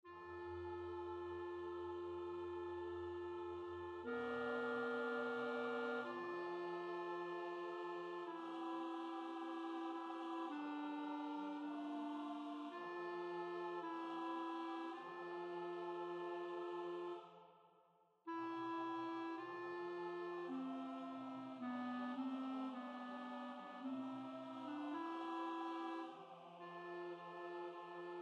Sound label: alto; Bona O Salutaris